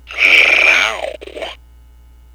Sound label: growl